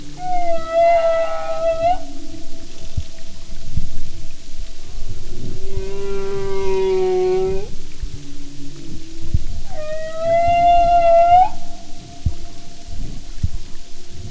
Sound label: humpback, Marine Mammals